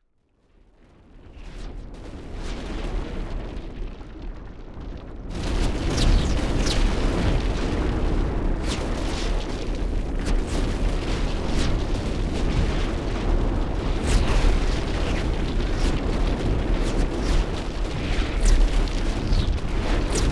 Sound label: Magic Earth Meteor Shower; David Dumais Audio; Magic Sound FX Pack 2